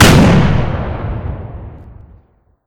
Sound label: explode